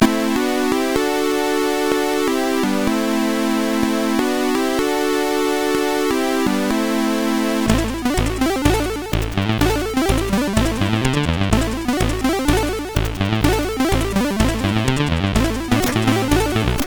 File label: Heli, CSG8580R5